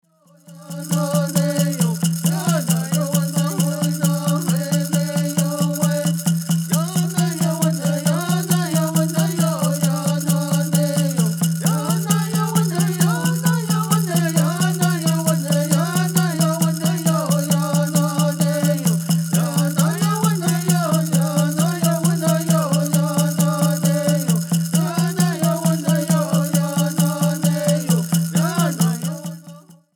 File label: Peyote Song